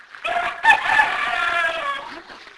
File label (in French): coq